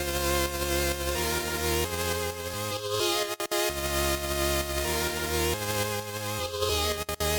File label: melody; Effectrix; EDM